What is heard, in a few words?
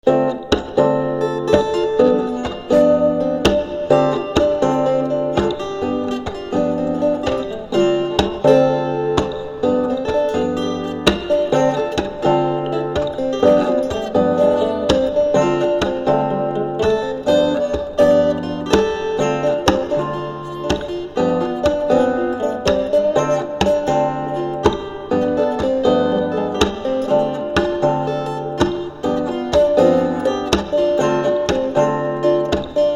Blues improvis